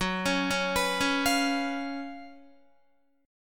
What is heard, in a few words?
F sharp Major 7th Suspended 4th, Guitar; F#M7sus4 arpeggiated